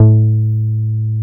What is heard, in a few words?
ULTRA A2, BASSES